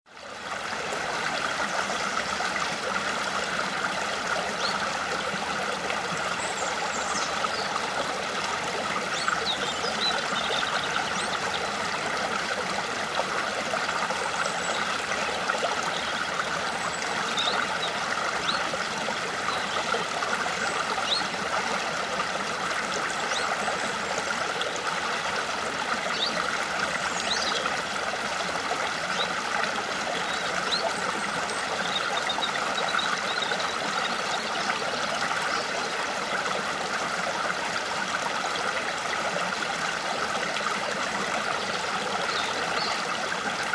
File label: Stream over Pebbles with birds, Close with background birds; Rivers